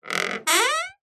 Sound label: household door wooden creak short